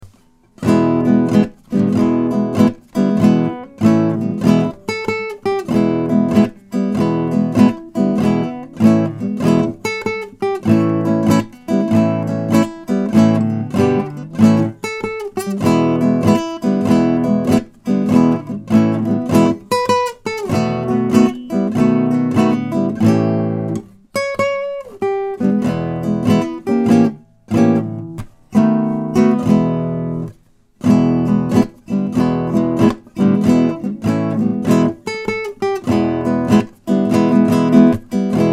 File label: Blues